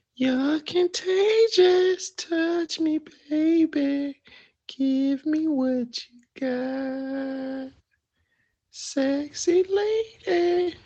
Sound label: singing